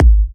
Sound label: G#, kicks; markus hakala kicks